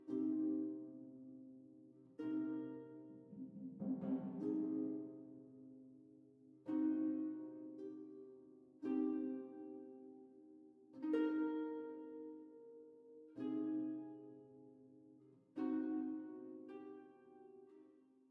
Harp; Necropolis

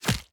Gunfire Hit; Devour; The Town

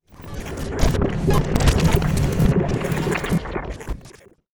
Glitch Wave

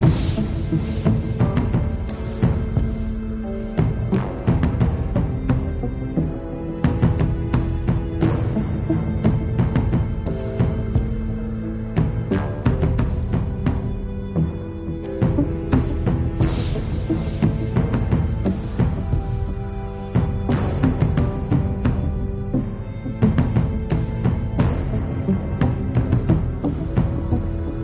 Loop Emotional Tense

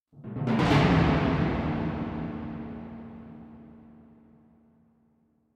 War Drums; Deception IV Blood Ties; Kagero Darkside Princess; PS Vita

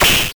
Scythe (Sound); Gloom

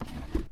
Drawer Open Fast; this is the zodiac speaking 2020 gamerip switch ps4 xbox one pc macos

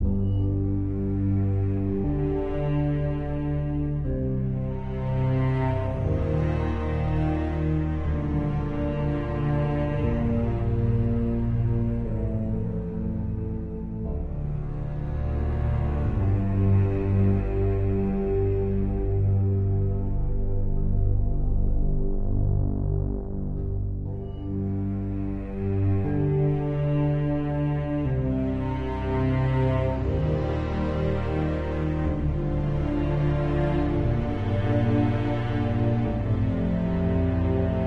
loop Sad

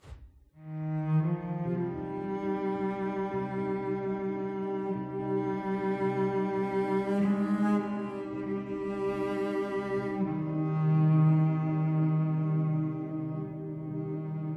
Melody; Outro Grey Interior First

